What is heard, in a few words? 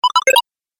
Collect Chiptune, video game